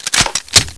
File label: akimbo clip in, weapons; GoldSrc